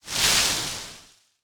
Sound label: leaves